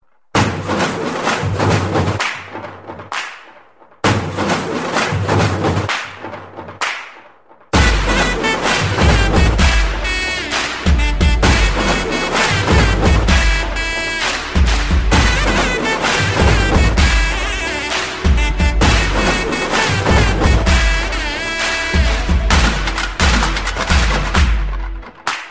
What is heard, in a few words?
Clap Beats